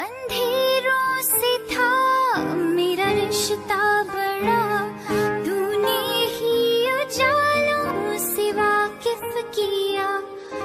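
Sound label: Female Version Sad Song Ringtone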